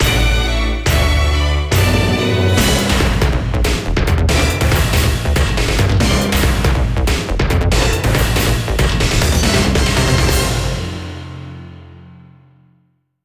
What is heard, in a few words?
Jingle Huge Timeup